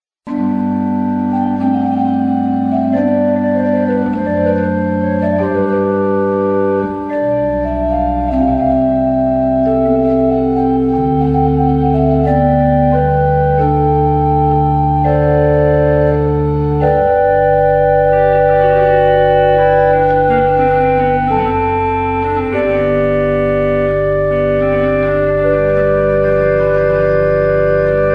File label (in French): Cromhorne en Taille; Corrette